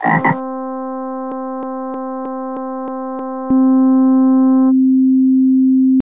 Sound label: Collage of Sounds